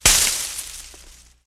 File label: Brush Crunch Sngl, trees